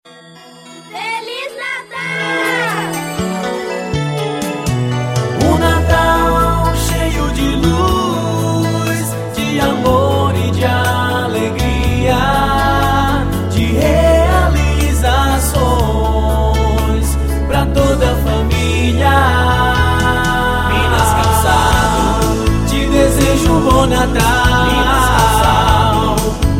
jingle de natal; minas calcados

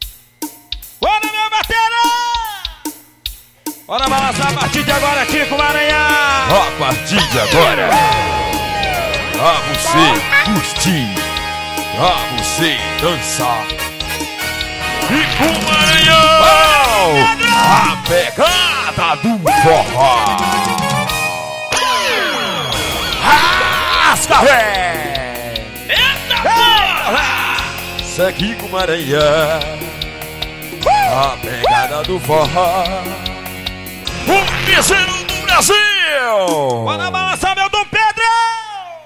abertura

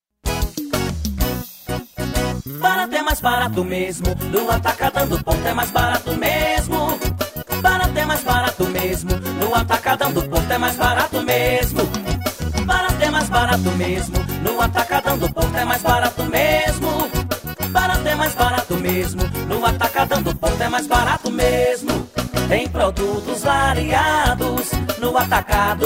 jingle; atacadao do porto

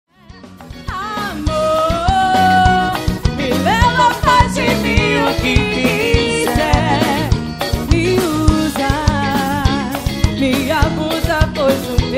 orquestra

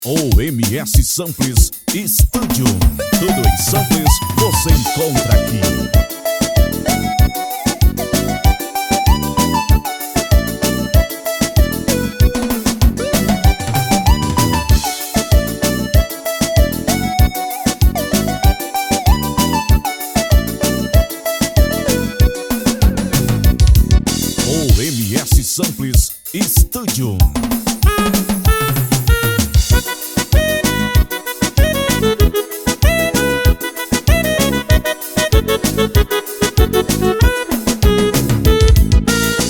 ritmo alemão do forró

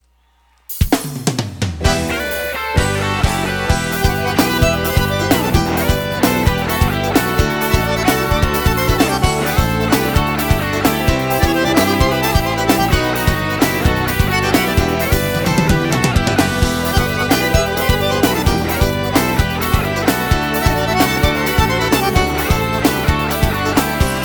Forro Swing Caliente

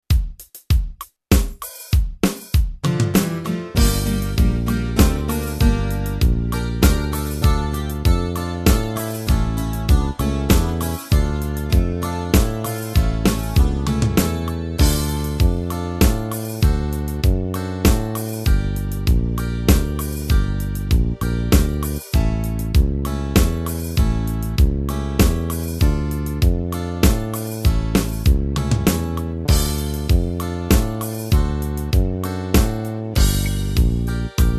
ritmos yamaha guarania

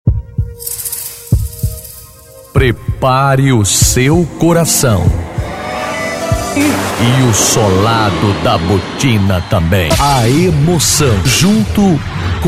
forró

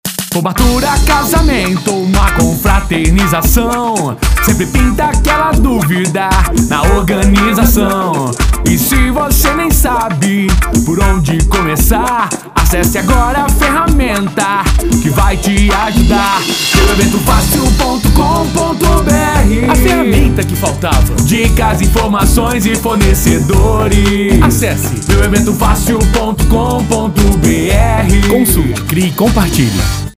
jingles

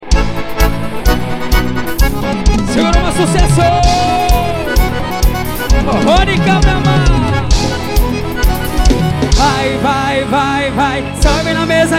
forro

